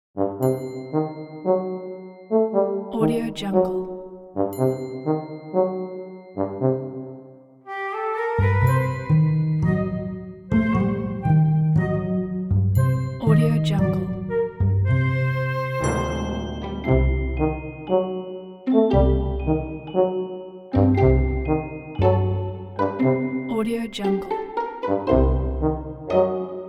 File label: a clumsy walk orchestral